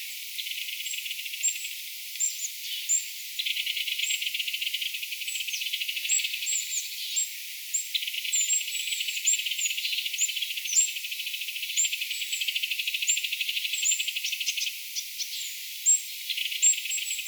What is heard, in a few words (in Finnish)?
kapytikka huomioaantelee, homotiainen aantelee; ilmeinen homotiainen